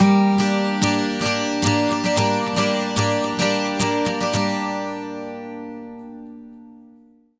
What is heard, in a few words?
110 12STR, Acoustic Guitar Loops; USB Soundscan vol.04 - Electric & Acoustic Guitar Loops; AKAI